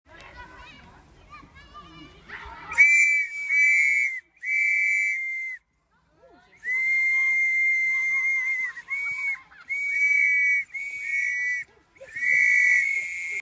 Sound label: Whistle